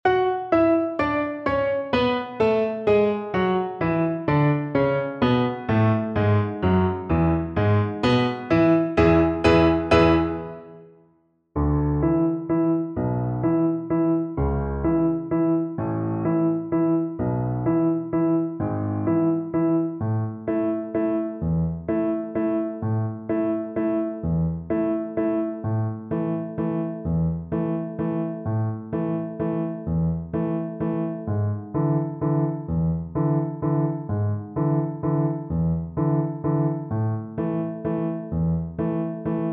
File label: lake wal, Viola